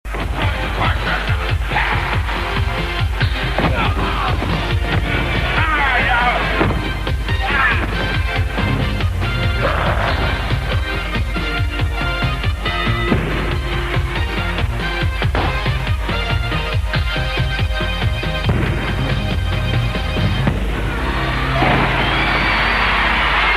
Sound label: jingle; wwf; tele5; tv total